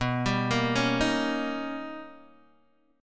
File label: B Augmented Major 9th B Guitar DADGAD